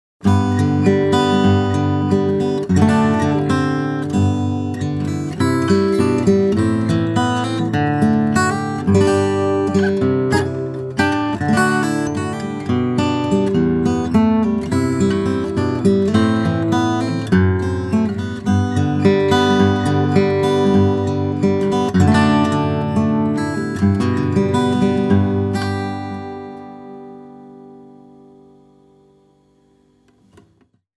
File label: Guitar Sample; Vintage vs Vintage style contemporary